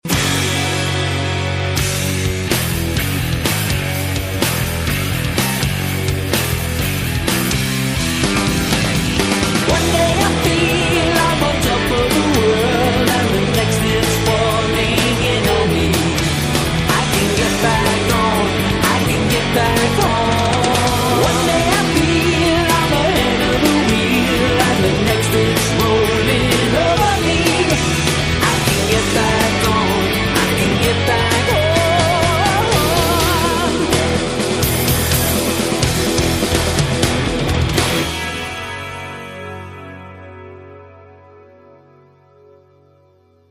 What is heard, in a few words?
remix; farcry